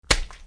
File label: bean atk; 弹球音效; tanqiu